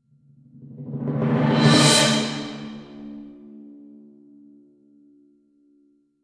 Stinger Action; Costume Quest 2

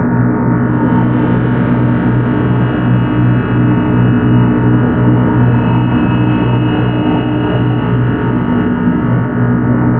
reactor hum

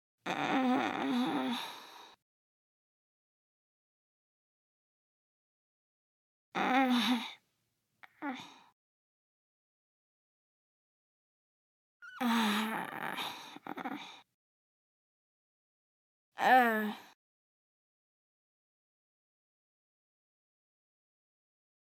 young-boy-groans; Voices; splicesound; Apocalipsis

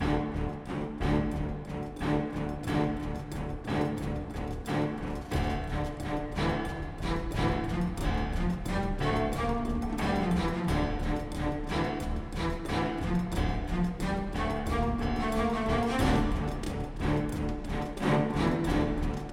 Motivational; Crysis

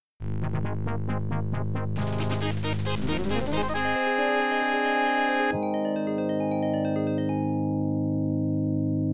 Fanfare, IBM-PC AdLib; Apogee

